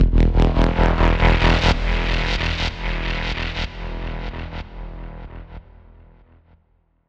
Bioscanner Zap E 140 BPM, Synths

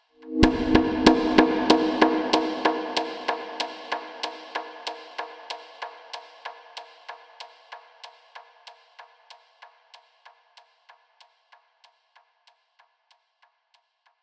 Conga Bounce 142 BPM; Drums & Percs; Percussion

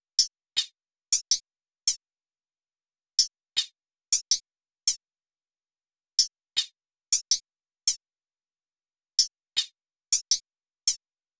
Drum Loop 160 BPM Hihat; Loop Stems; Cymatics; Vibes II Drum Loop Collection; Once Drum Loop

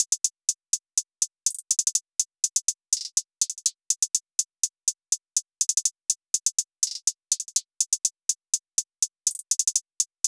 Hihat Loop 123 BPM; Right; Hip Hop

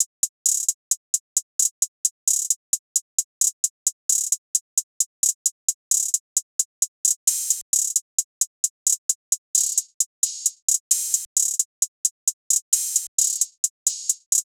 Space Craft Hihat Loop - 132 BPM; Cymatics; Fiji Hihat Loops & Percussion

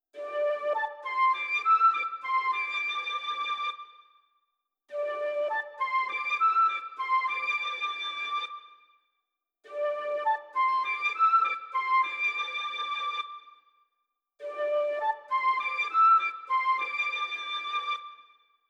flute, 101 BPM D Min; Cymatics; Blue Faces

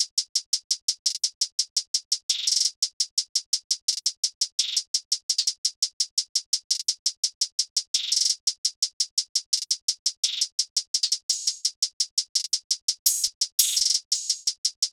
Winter Hihat Loop - 85 BPM; Cymatics; Fiji Hihat Loops & Percussion

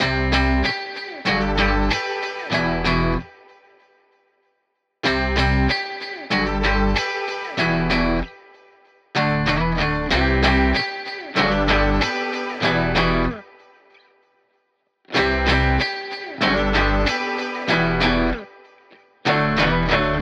95 BPM G Maj, Guitar; Menace; Cymatics; Miami Guitar Pack - Pt 2

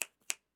Fingers Snapping; Fairlight Sound Lib

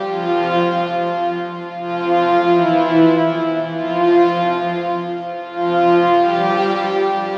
Pad Loop 130 F, Techno; Techno Sounds 2024; Pads

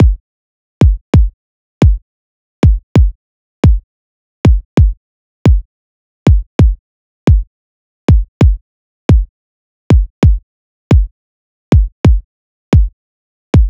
Drum Loop, 132 BPM Kick, Garage; Loop Stems; Cymatics - GHOST (Beta Pack); Centre Drum Loop

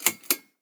Light String; Dubstep; Ghosthack; Dubstep Sound Arsenal; Foley Percussions

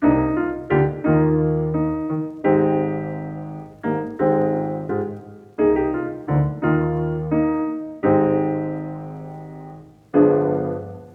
Piano, 86 BPM D# Maj; Soul Food

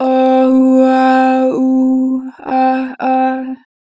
Adlib, Harmor Vocals, Pitch Flat; 2 bar flat

